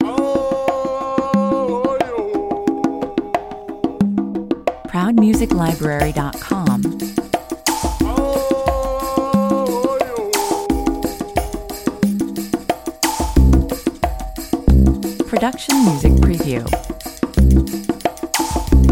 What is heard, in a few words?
afrikaans 90 bpm